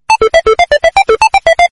High Volume SMS Ringtone; ringtones

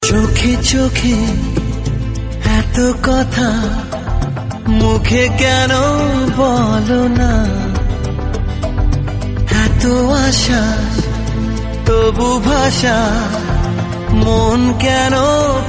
Ringtone Bengali; ringtones

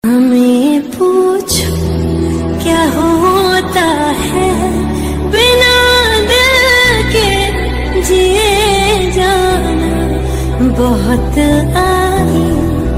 Female Ringtone

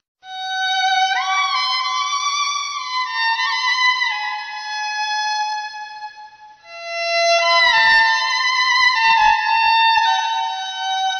Old Song Instrumental Ringtone; ringtones

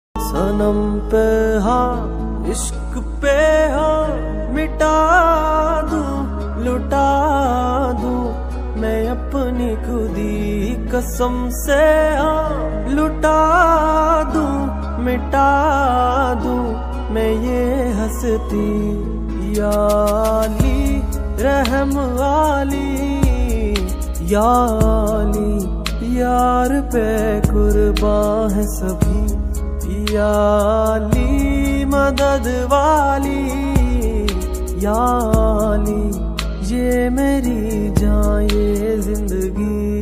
Sad Version Ringtone

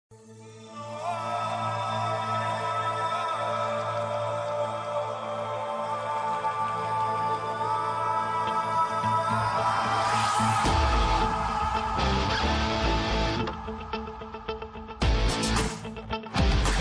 Tamil Theme Ringtone; Bigg Boss Tamil

